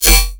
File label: Phase Plant, bass